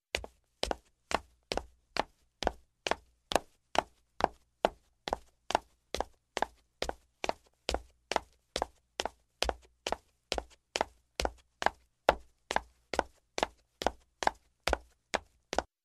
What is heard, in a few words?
footsteps wooden steps male